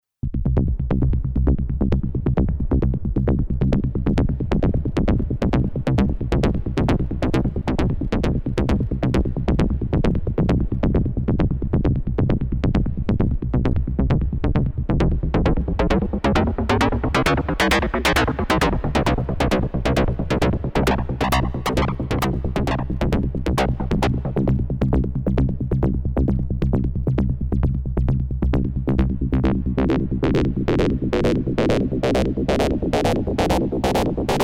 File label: yamaha dx 200